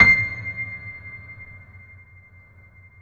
PED MF, GRAND PED; Best Service Advanced Media Trax Modern Composer

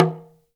TALK DRM, Heart of Africa; Spectrasonics

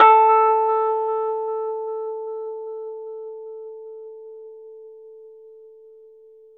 Rhodes 73 Slow

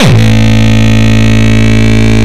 Longkick, kicks

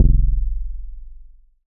BASS SH09 F0, Synths, Roland SH-09, Bass

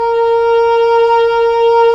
Cor Oboe; Roland - LA Composer vol. 4

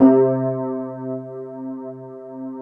WET RD C2 L, Keyboards Old School; USB Soundscan vol.09; AKAI; WET ROAD

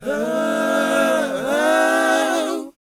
BOYZ B, Vocal Planet R&B & Dance; ILIO

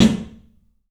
club snare, 3D Drums; 3D Sound

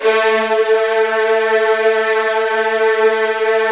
A1, Virtuoso Strings

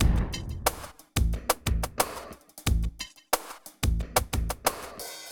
90 slow, loops, hyper dance; Best Service

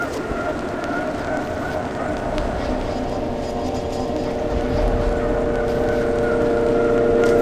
loop, techno atmos

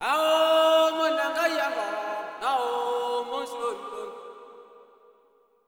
SOLO G#, Heart of Africa; Spectrasonics; Heart of Africa 2

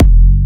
Jump Kicks, Bassdrums

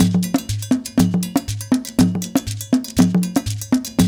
JU JU, African Contemporary

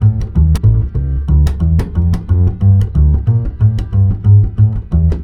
BASS, Balkanish Traditions; USB Soundscan vol.63; AKAI

